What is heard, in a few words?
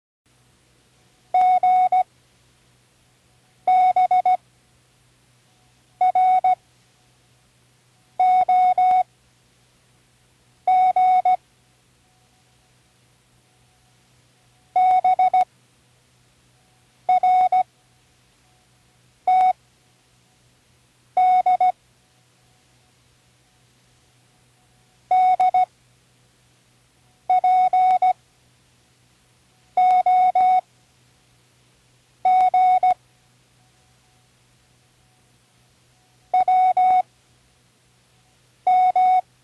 random, Learning Morse Code; Tune in the World